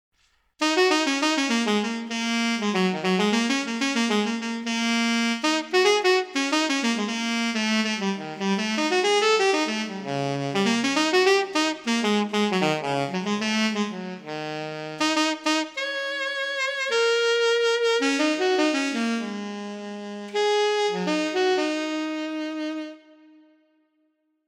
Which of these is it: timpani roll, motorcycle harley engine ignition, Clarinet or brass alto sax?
brass alto sax